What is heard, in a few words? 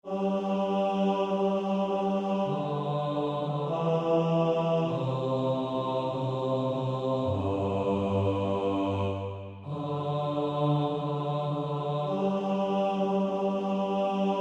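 bass, practice music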